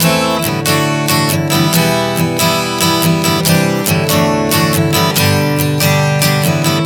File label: Am-D-Em-C-D-G 140 bpm, acoustic guitar chord progressions